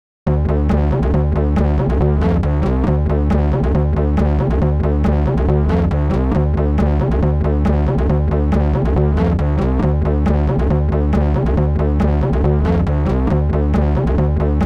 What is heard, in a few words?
bass